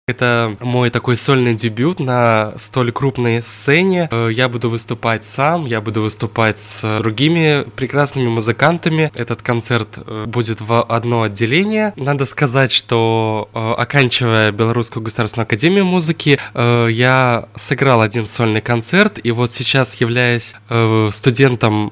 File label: bayan in jazz